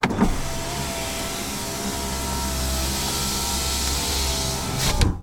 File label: van window electric up iveco; transportation; sound effects one